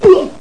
Karate; fightingspirit

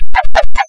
max-min; Witchaven

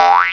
Medium Boing

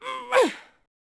push; Pool of Radiance

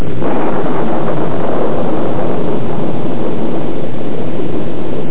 Thunder

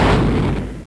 Plasma round; Starbound II

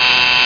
BUZZER; Shoot 'Em-Up Construction Kit (1989); Palace

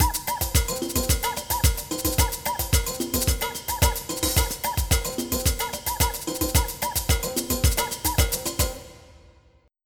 SAMBA

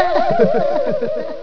laff

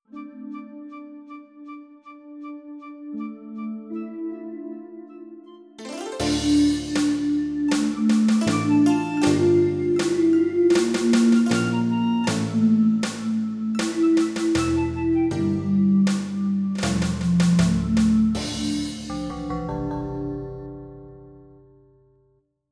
END LOSE

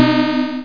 Trap; IQ2 Demo

